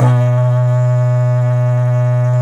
B1 HORN R, Didgeridoo and Other Primitive Instruments; Big Fish Audio; DIDGEHORN1